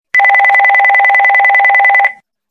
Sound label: Dual Pulses, ringtones; Tecno HiOS 16